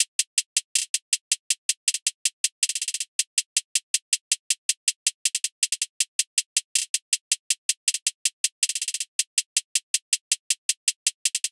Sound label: Drum Kit, Hihat, 160, Trap; Stems; Achieve Drum Kit